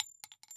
casing 9mm; arccw; weapons

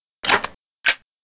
reload; hl2; hmg1

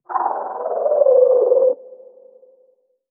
chirp, protozoan; hl1 npc

